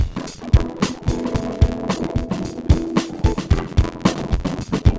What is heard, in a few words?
Latino